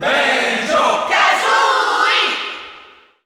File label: Cheer Italian; Banjo & Kazooie; SSBU